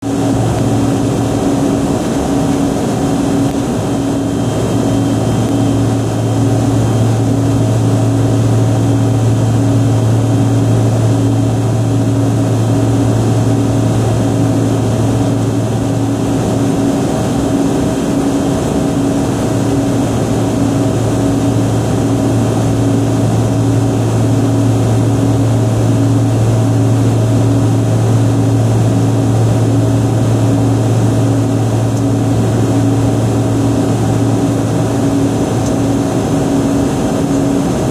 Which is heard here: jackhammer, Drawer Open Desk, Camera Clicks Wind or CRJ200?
CRJ200